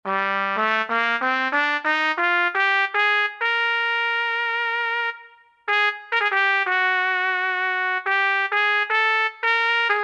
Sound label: Trumpet Solo, Roland JD-990; Factory Patches